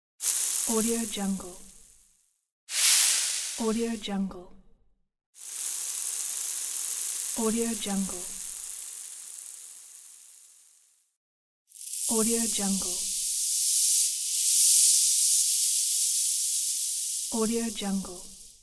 sizzle

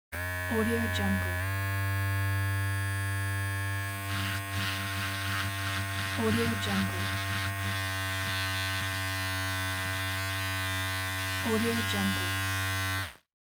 shaver hair trimmer friction; cinematic-sound-design

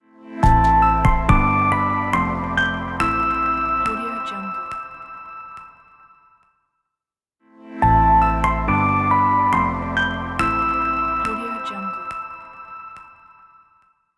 elegant logo

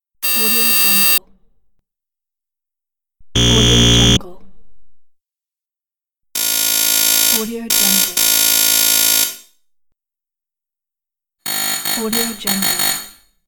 visitor presses door buzz

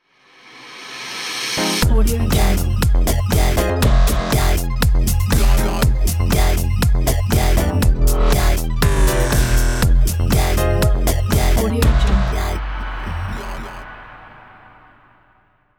short logo

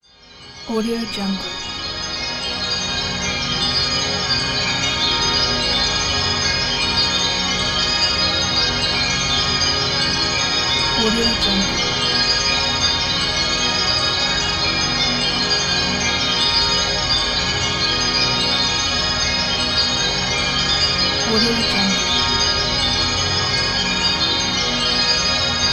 scifi bells

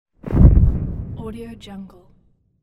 thud; volkovsound

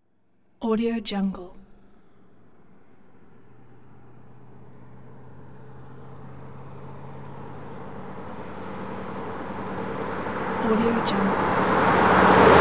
jeep off road by at 40 mph; hollywoodedge

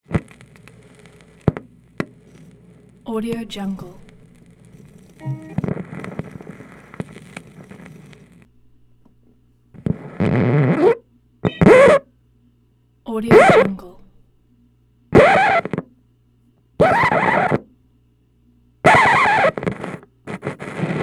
record player ge wildcat portable needle down

